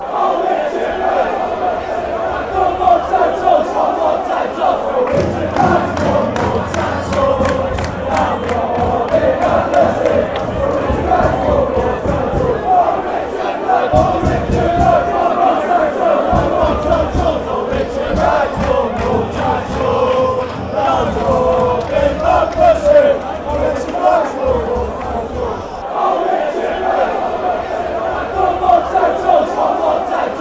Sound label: city chant, fanchants